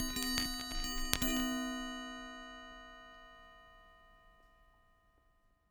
Baoding Balls

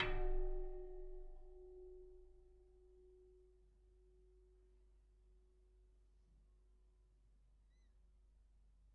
Bicycle Spoke

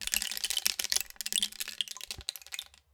Rain Stick, Percussion Samples

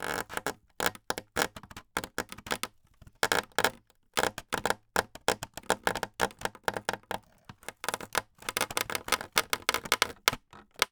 Polystyrene Creak

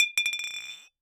Bottle Struck

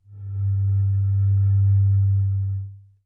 Bottle Blown

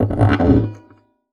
Classical Guitar Extended Technique

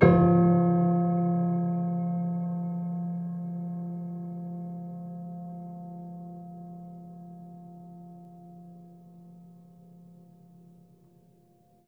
Harm Ped Low, Piano Harmonics

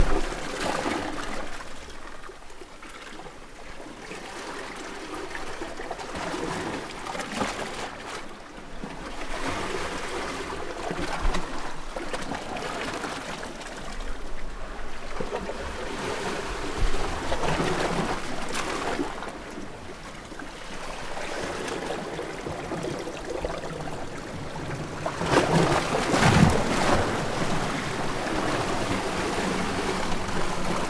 waves

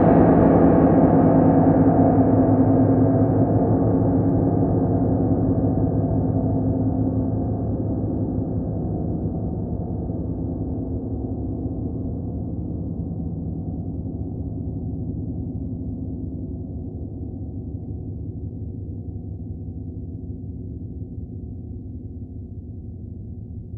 String Brush No Att, Piano; Piano Miscellaneous

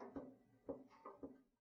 Ping, Kitchen Toaster

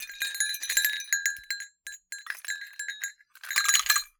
Cowbell Chain, Percussion

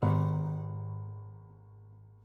Prepared Piano

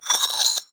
Hole Saw Scrape; Hole Saw Bits

